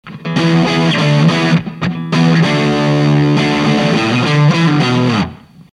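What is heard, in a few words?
353, HARD, LF353N; 高中低域のバランスが何となく / 私の好みかもしれません。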